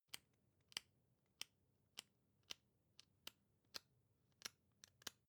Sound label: magazine browse